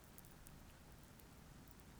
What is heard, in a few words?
pulses, BALAENOPTERA; MONACO 2022-07